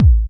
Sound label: BD, Electro Soul Kit; drums; STYLE KITS (Hip Hop, Etc)